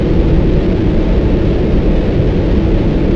uav; stronghold

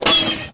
start, bf109; lfs

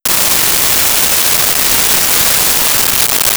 shock cont

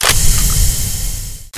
plasma vent

